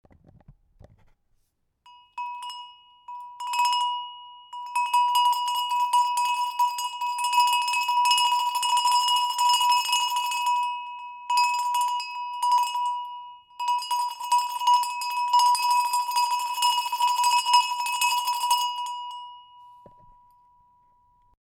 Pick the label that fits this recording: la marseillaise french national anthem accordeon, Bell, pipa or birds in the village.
Bell